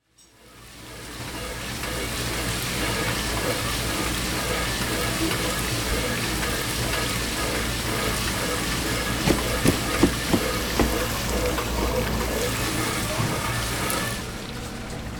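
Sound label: dishwasher; Chino Cultural Complex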